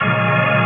ORGAN R, Drum & Bass 180 BPM; Ueberschall; Drum & Bass Flash Trackz

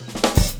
FILL

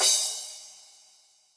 C Crash, Yamaha DTXpress Cymbal

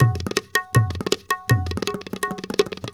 INDIA L, Heart of Asia, IND ENS; Spectrasonics - Heart of Asia CD2

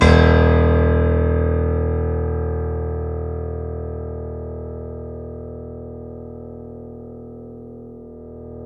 C7 FF, The Ultimate Piano Collection; East West

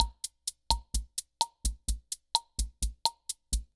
Guild MG10C-1 Loop, Drum Machines; Guild MG10C-1 Sample Pack; 300 Drum Machines

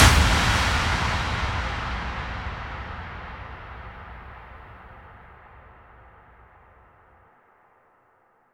Impacts, Effects

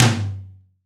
TOM L, LA TOM

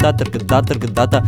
INDIA R, IND ENS PH, Heart of Asia

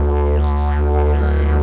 Digerido; Best Service; Ethnic Journey Sound Cube

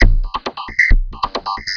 Drums Strange

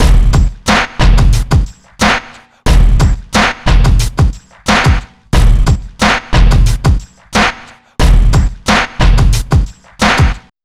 kit 090bpm; Zero-G; Downbeat Dance Grooves

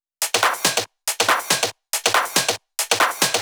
Loops 140 BPM Hihat Percussion Loops

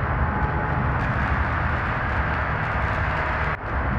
sparse soundscape samples; ReLooped

